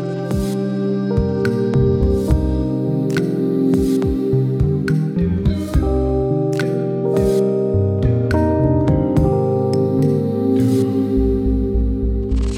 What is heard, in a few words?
Lush Chords; BB 2HTC Samples Vol 4; Pads